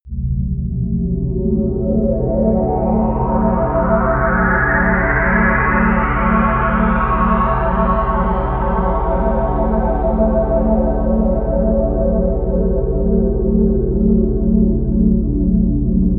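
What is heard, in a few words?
mysterious alien, pads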